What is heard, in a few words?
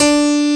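piano attack D4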